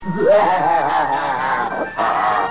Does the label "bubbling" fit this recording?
No